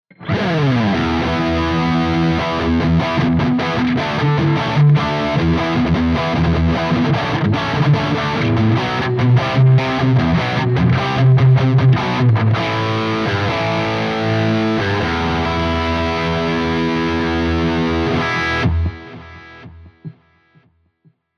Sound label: Rhythm Lead